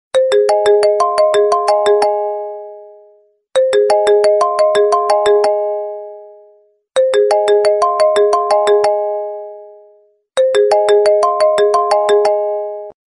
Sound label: apple ring